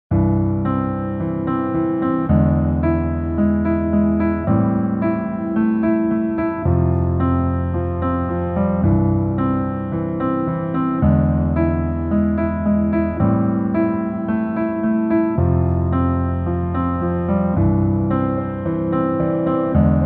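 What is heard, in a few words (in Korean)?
Piano version 멜로디 MR -1키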